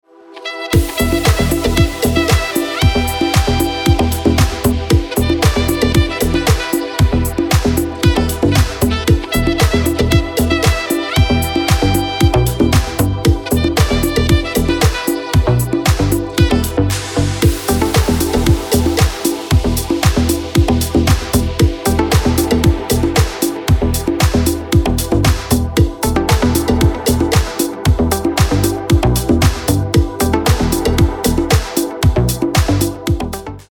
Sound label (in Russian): house rhythm, саксофон